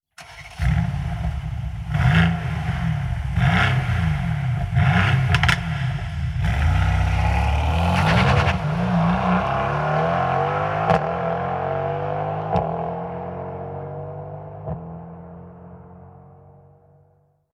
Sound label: Sound AMG GT R